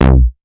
Synth Bass; Vengeance Samples; VEE Synth Shots

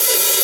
Open Hihat, Cymbals; Vengeance Samples

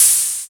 Cymbals HH Open; Vengeance Samples

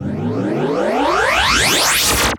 VFX Short Fills & Reverse

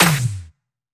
Claps; Vengeance Samples

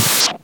Minimal Perc; Minimal Percussion

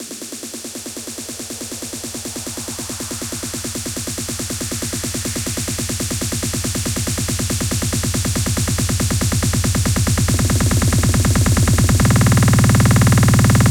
140BPM 8Bars, HandsUp Trance Fills; Dengana; Vengeance Samples

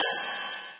Cymbals Ride; Vengeance Samples

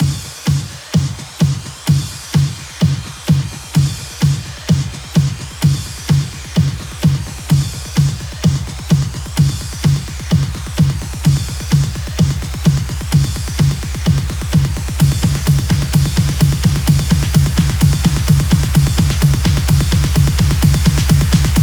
128BPM The Big Bam, House Electro Fills; 16Bars; Vengeance Samples

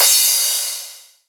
Crash; Vengeance Samples; VES1 Cymbals

